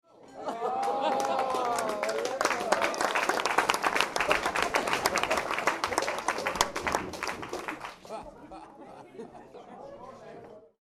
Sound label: Applause and Vocals small Interior Crowd; I love her; Applause Sound Effects